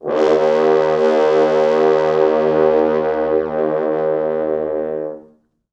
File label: 4 FrHorns